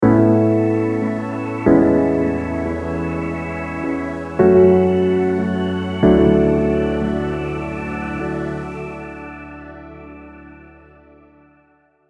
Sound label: A Major Prog, Chord Progressions